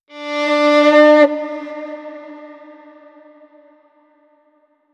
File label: violin that sounds liike